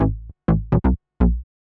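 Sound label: synth bass, house, 125, A; eJay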